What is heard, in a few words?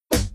player hit; spider-man-mysterio-rush-game